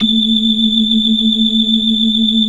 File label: 69 BLOOD L 2, ORGAN; Keith Emerson Signature Series